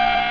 buzz

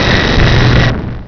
beam start, debris

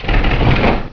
door stop

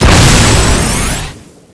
alt fire, shockrifle

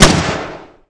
aa12 shoot; weapons

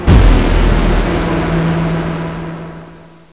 die

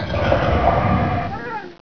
ichy die

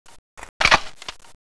saw reload, weapons

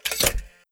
lever

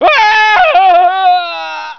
scream, scientist

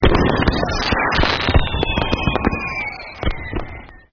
fireworks, ambience

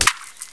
bullchicken spit hit

